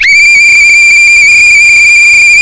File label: whistle